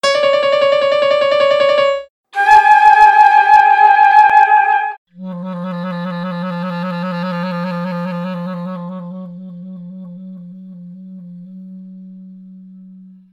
Piano Db D Flute G Ab Clarinet F F

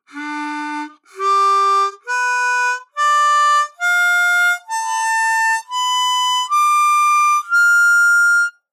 draw notes; Neuma

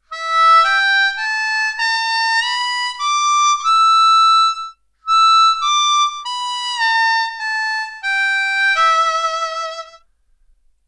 Blues Scale 3rd position D Harp

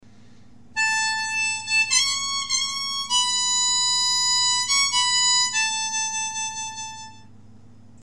solo

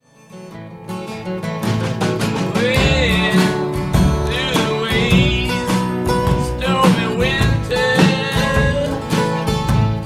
chord cycle

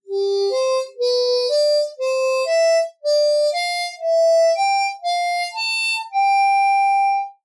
Slide Note Exercise

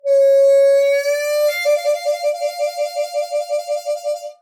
Portamento 4 5 Trill, harp

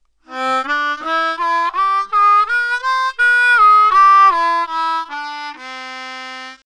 lower octave major scale

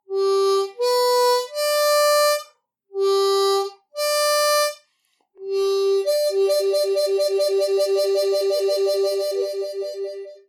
Tongue Trill, harp

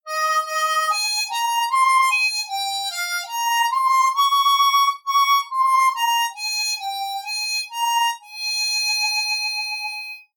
Tremolo, harp

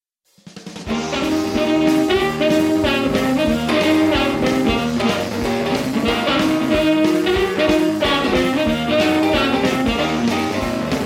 Hunch Rhythm, harp

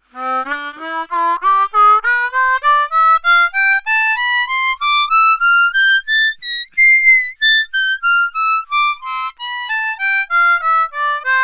connecting all three major octaves

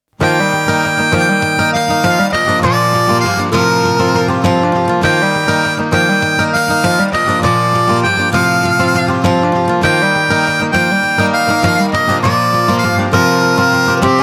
Acoustic Harmonica

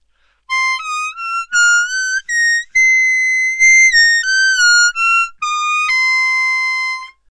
blow bends

